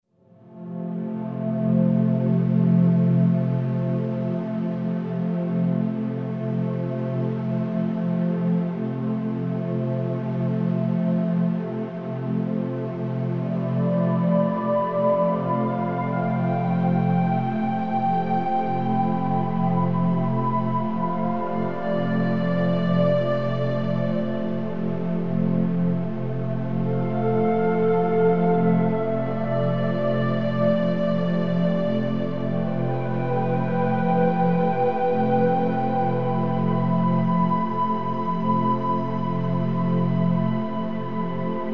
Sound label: Uplifting synth, panning effects; Warm Ambient; Ambient SoundScapes